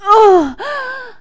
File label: gasp